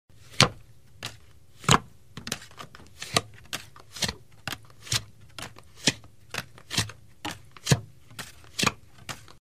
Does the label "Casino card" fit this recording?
Yes